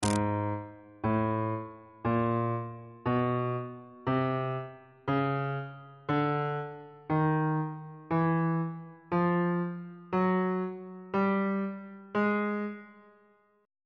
a flat chromatic scale

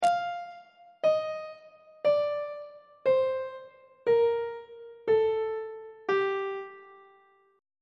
g minor scale descending on treble clef

